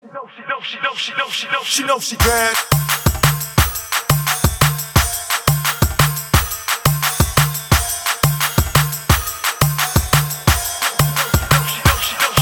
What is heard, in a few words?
Trap Bass